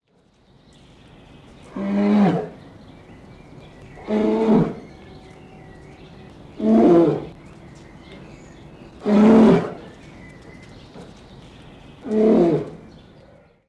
Eisbaer Rufe; Guenter Tembrock

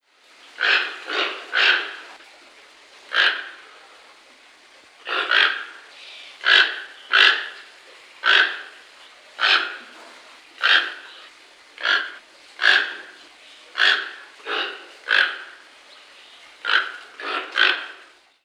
Riesentukan Ramphastos toco Rufe; Guenter Tembrock

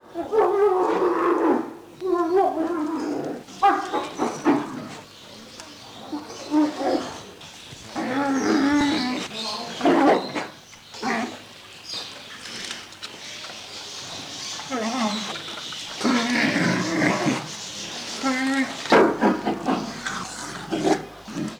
Kragenbaer Laute bei Fuetterung 3 Tiere; Guenter Tembrock

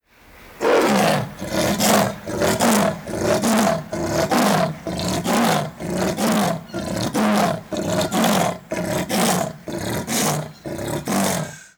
Persischer Leopard Panthera pardus Rufreihe; Guenter Tembrock

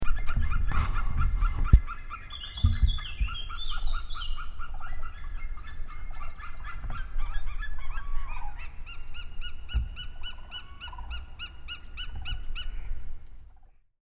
Seeadler Haliaeetus albicilla; Karl-Heinz Frommolt

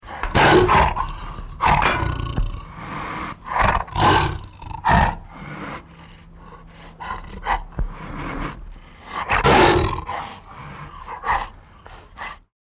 Tiger Panthera tigris

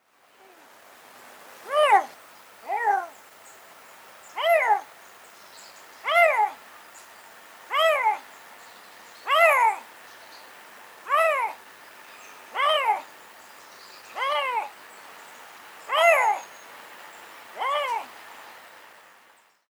Europaeischer Luchs Rufreihe Maennchen; Tierstimmenarchiv des Museums fuer Naturkunde Berlin

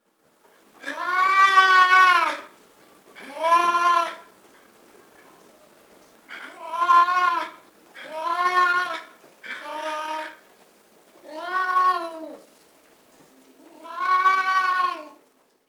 Nebelparder Maunzen; Guenter Tembrock

